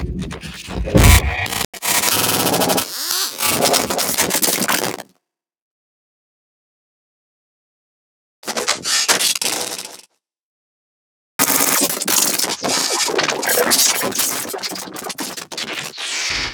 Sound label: Anime Moans Now Are Glitc